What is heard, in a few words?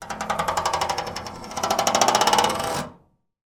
foley metal grill scrape; zapsplat